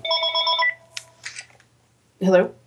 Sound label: ring ring hello